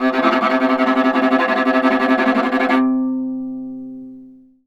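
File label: VIOLA tremolo C2; Orchestral; DirectWave; Varazdin Orchestral; Viola II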